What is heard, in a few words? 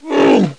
GRUNT; starwar